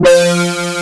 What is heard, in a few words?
AN SYN, SYN & LEAD; SAMPLE WAVE MIXING 2